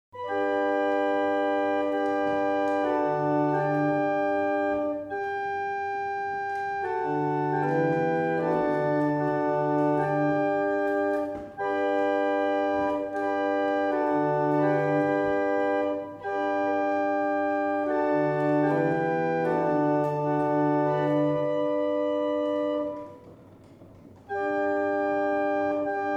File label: Single chant, barrel organ; Winwick; Tallis